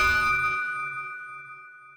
sonar Tail Water Very Close Beacon; Barotrauma; Real Sonar

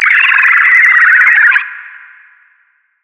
Lithomorph Idle; DynamicEuropa

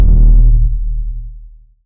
sonar Tail Suit Very Close Beacon; Barotrauma; Real Sonar

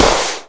flareshell burst; Weapons; EK modern; Barotrauma

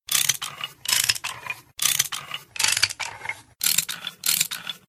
Repair Loop; The Avali's Audio Overhaul

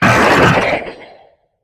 death; Barotrauma; Barotraumatic; HumanImmortal

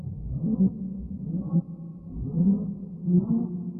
Ice Whale Idle; Barotraumatic

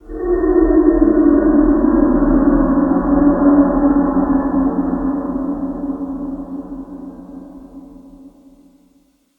Distant Pod, Ambient; Barotrauma; Room FX

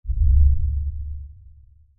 sonar Ping Air Medium Beacon; Barotrauma; Real Sonar

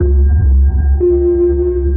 sonar Ping Suit Far Shuttle; Barotrauma; Real Sonar

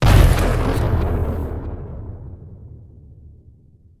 railgun, Weapons; Subsurface; Barotrauma

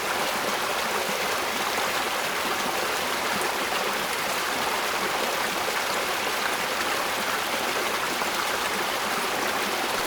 Flow Medium, Water; Barotrauma; Subsurface

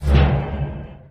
structure blunt; Damage; Barotrauma; Subsurface

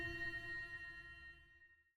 sonar Tail Water Medium Beacon Directional; Barotrauma; Real Sonar

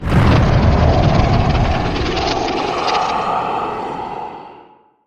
death; Barotrauma; Barotraumatic; Carrier

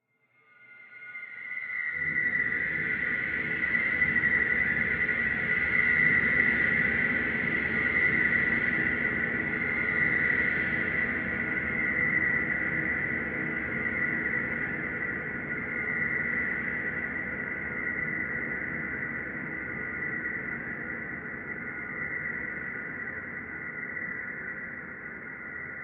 spawn; Barotraumatic; Crook

